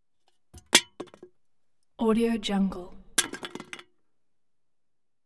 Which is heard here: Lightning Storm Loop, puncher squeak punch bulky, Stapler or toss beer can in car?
toss beer can in car